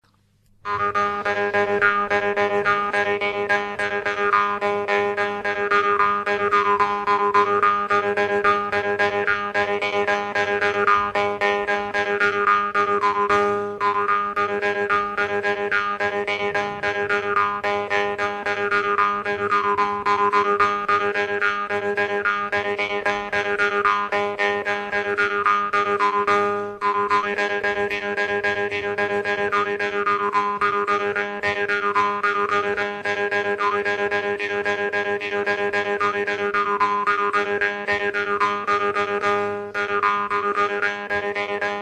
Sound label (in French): Guimbarde solo; Albrechtsberger, Johann Georg